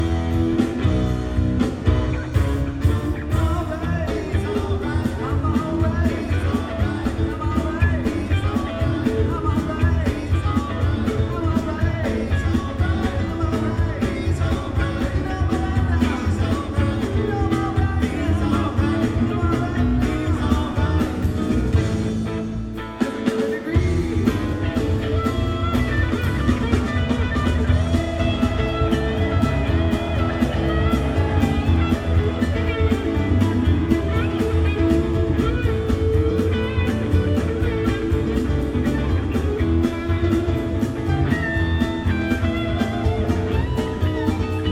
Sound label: Blues; San Francisco, CA - Bill Graham Civic Auditorium